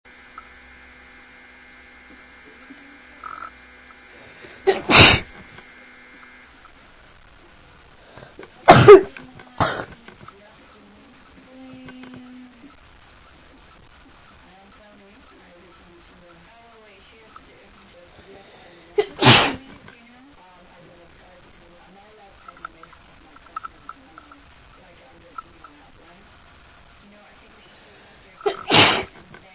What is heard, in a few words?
random sneeziness, female